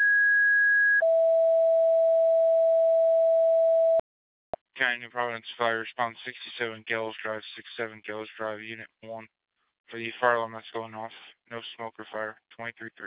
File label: two tone